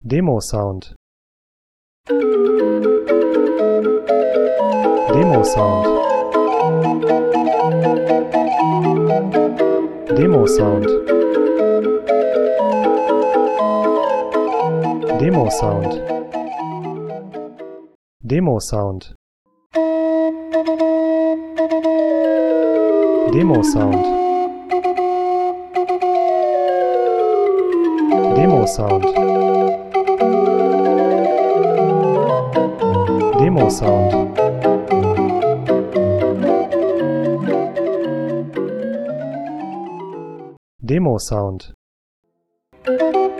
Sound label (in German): Drehorgel; Demosound